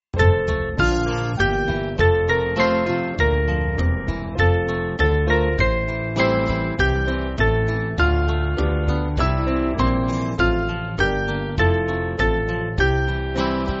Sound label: Band, church music; The Duteous Day; Innsbruck